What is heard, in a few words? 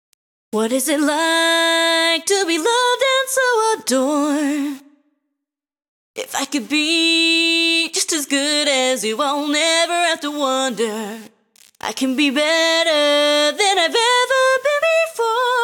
LAYER VOCALS; Ikenfell